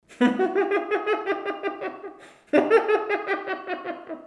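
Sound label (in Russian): звук смеха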